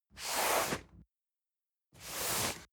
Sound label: cardboard box medium slide; objekte